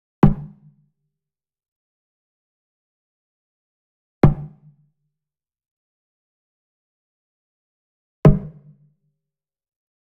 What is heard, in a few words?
Wood Percussion Wood Drum Mounted Hit Beater Near; boom library; Cinematic Strikes Construction Kit